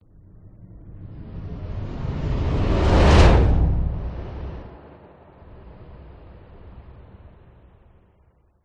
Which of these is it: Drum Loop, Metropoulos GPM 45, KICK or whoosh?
whoosh